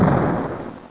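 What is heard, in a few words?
explosion underwater; Zoom - Release 2; Active Software; worms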